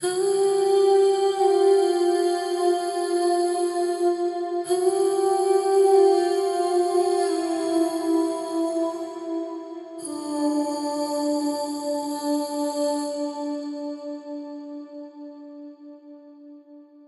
Female Vocal Pianoverb; Temperance Lite

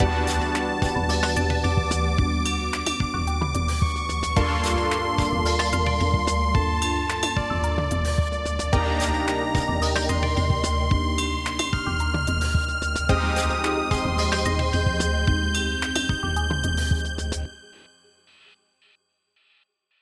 bend off

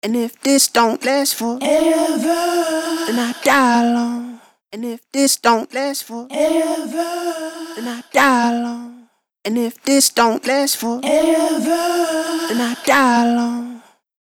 Vocals Smooth; Omnipressor; Preset: Smooth LA

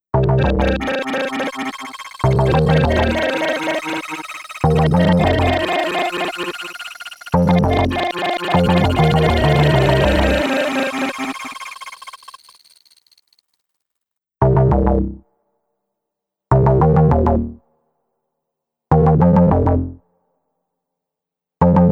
Bass Synth; Preset: Synth Surprise

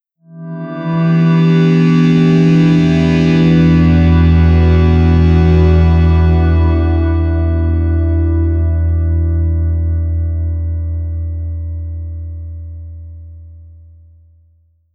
DX; Preset: DX 2077; Generate; Newfangled Audio

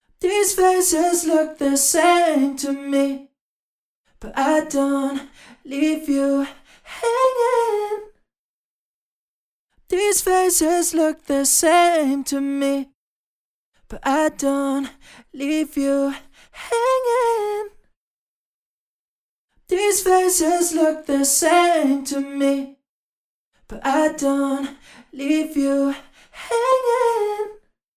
Vocals Micro Pitch; H3000 Factory Mk II; Preset: MicroPitch Designer

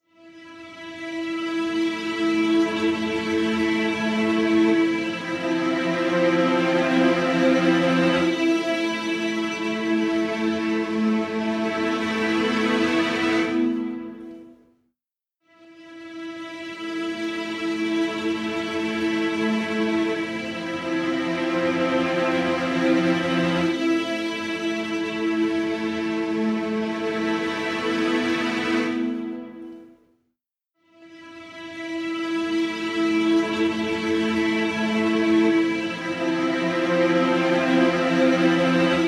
String Ensemble Micro Spreading Binaural; MicroPitch Immersive

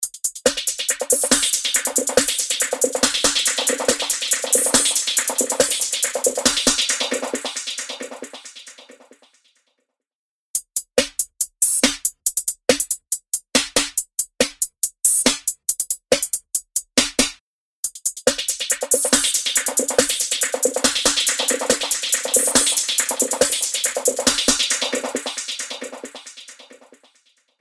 Snare Hat BAND PAN; H3000 BD Mk II

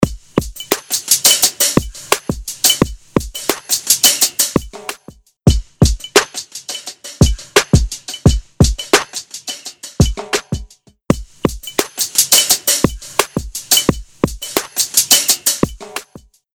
Drum Loop Dynamic Reverser; Omnipressor; Preset: Dynamic Reverser

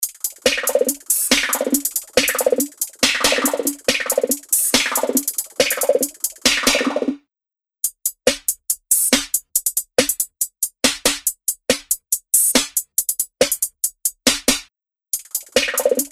Snare Hat TOM SWEEP; H3000 BD Mk II

